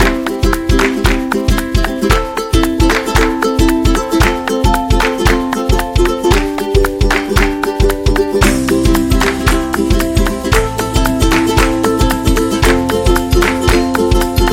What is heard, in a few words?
Pop Folk; happy music vol 2